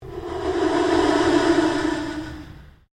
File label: PULSE LONG SWEEP